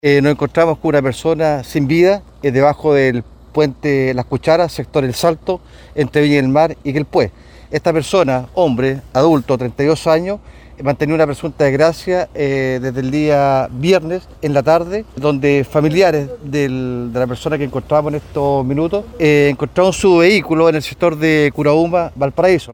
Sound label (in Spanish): carabinero tambor